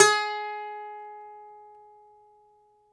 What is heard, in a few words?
DOMRA